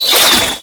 Projectile Whiz By A; Ultimate Abilities; Madurai; Warframe; Focus

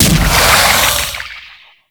Fist Impact Flesh; Warframe; Mummy

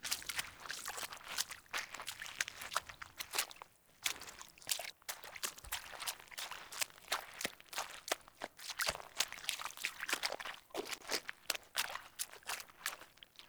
Wolf Steps Jog Loop Water; Animals; Footsteps

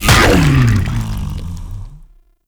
Consume Impact B; Warframe; Grendel

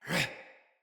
Attack, Battle Barks; Envoy

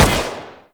Rifle Prime Shot B; Tenno; Bard

